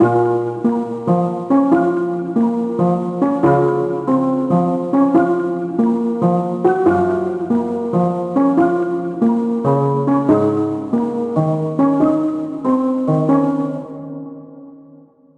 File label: Keys